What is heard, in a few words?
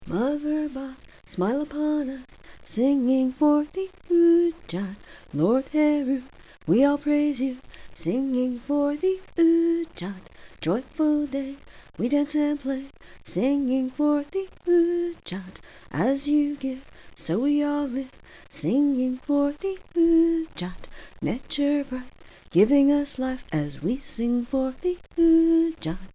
singing; udjat